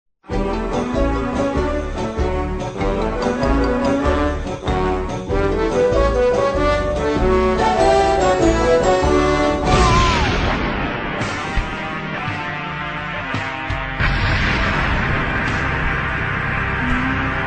stage clear

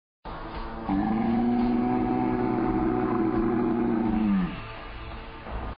OOOOOH

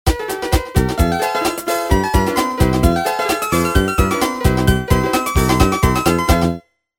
backing

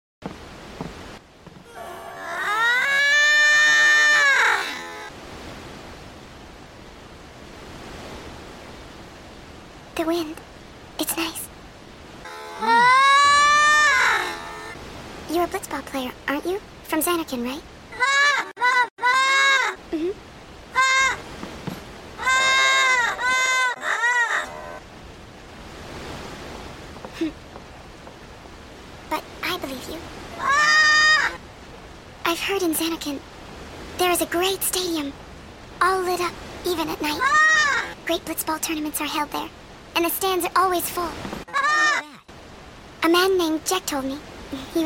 aaaah

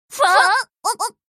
clock

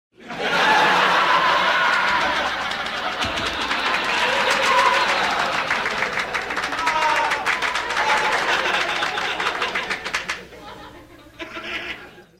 haha; cave

